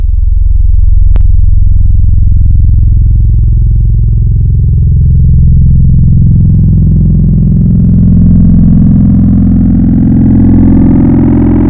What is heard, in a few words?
Double Sine, Wavetables; Cymatics; Secrets Wavetables; Serum